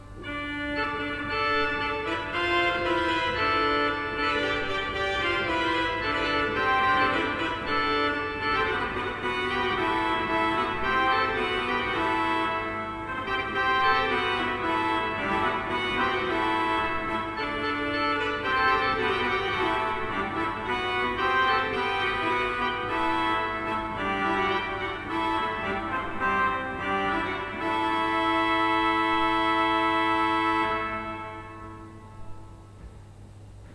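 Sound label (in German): Orgel, pfeifenorgeln; Seifert Kevelaer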